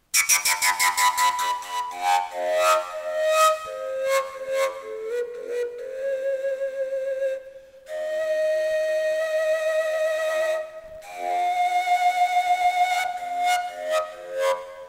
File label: f fujara concert elder; overtone fujara